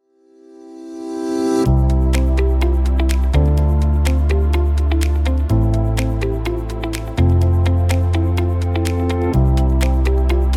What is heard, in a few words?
Corporate Uplifting